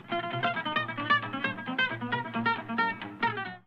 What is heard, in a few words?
chromatic riff; django